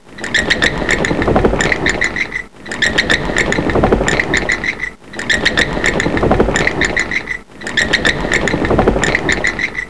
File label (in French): chsouris